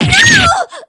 superdamage; yukari

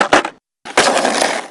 start skate; bucky

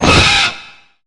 point; jezail

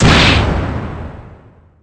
gunshot

